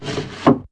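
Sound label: Drawer Open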